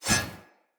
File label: Sword Whoosh Accent; Weapons; Player; Swords; Fenn Jotar Sword